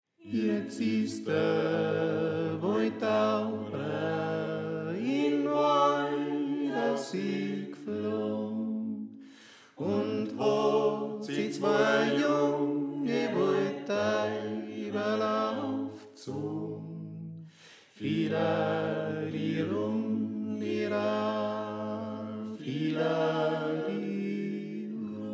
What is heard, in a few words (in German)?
Tenor; Iatz Is Da Woidtaubra